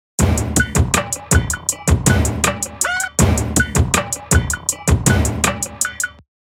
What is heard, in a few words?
Trap Hihat